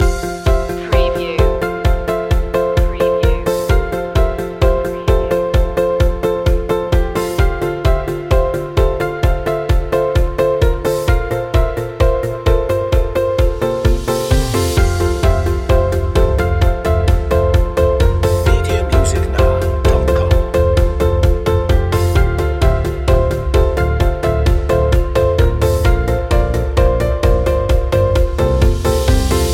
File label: corporate awakening loop